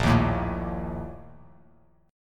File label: Bb Major 7th Suspended 4th, Bass Standard; strummed; JGuitar